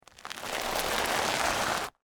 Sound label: Scrape Ramen Noodles; Texture; Scrapes (Sound Effects:Objects); BlastWave